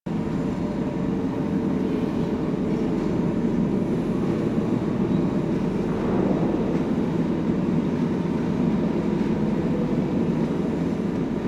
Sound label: Galleon Small Room Amb; Warframe; Ambience; Grineer Galleon